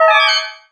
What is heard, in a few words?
Magic; Easyrpg; rtp2k3